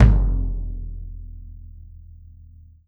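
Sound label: bass drum open; E-MU Producer Series Vol. 7 – Old World Instruments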